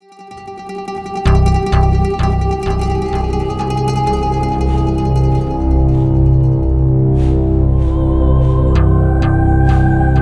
Music - Earth Rock Fire; bet on soldier blackout saigon

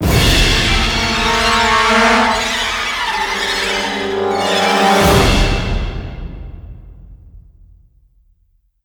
String Large

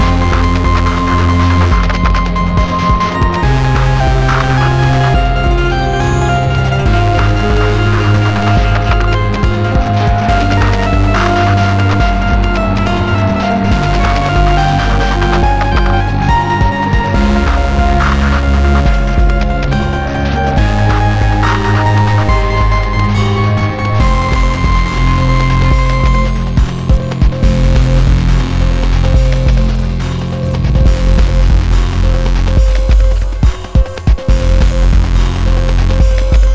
Glitch sonata Medium

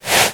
doll move; Birthday Party Bash; Wii; Spanish; fashion room sfx

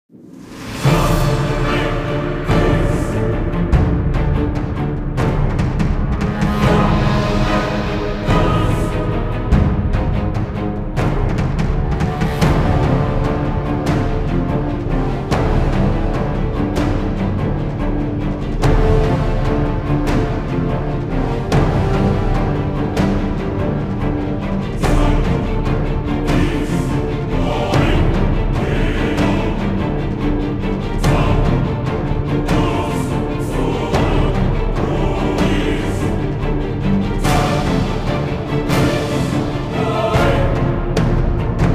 Pvp

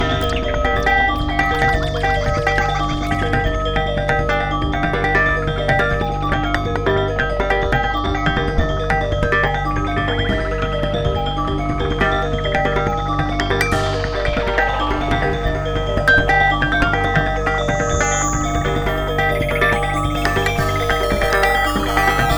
Gamelan techno Slow; RIVE: Wreck, Hack, Die, Retry

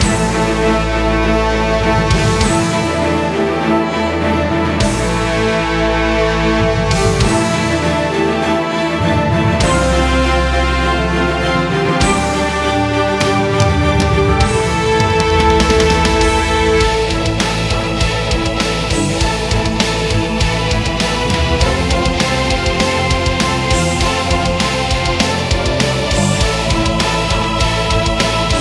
TENSION Combat theme; 7554; Windows gamerip 2011

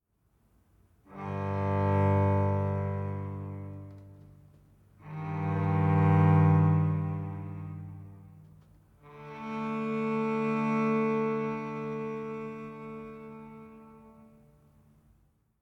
Savannah Music

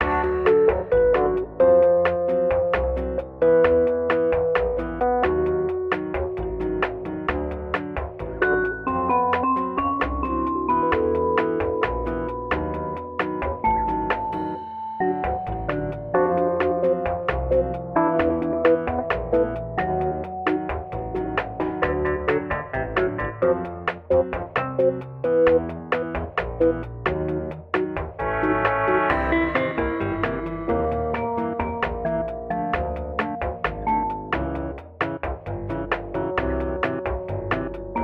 Lounge Radio; voidigo windows gamerip 2021

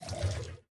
Add Turbo Oil; Cruiser; lethal company version 40

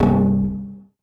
drum single; mr future ninja

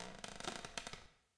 floor creak; Five Nights at Treasure Island 2020 Remake

